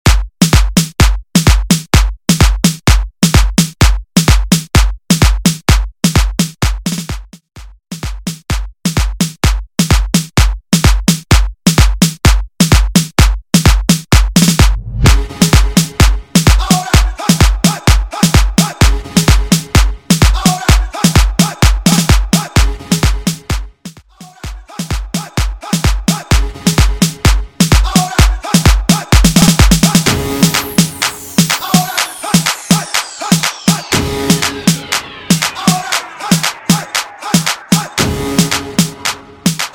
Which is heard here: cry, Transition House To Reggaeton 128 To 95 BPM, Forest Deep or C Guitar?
Transition House To Reggaeton 128 To 95 BPM